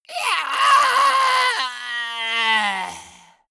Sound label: monster die high